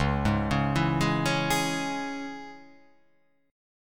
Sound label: C sharp Minor